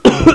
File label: Short Cough; Exile v1.1.3